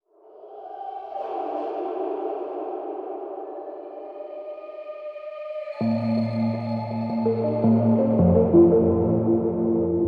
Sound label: Underwater Swimming; assassins creed origins unofficial soundtrack ps4 windows xbox one gamerip 2017